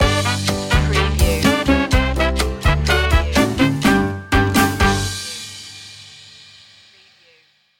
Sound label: latin fiesta on the wall stinger